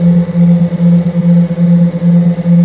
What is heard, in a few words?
laser; vendetta